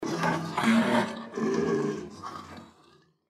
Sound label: deathclaw chase; npc